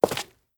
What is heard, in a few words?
Stone Walk